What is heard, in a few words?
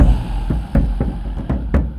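Music Shield; From Dust; ps3; xbox 360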